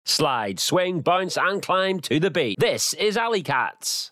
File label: slide swing